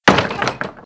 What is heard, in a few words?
Barn Door Slam; Playable Tutorial Level